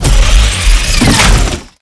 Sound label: Drone Vulcan Death; blue archive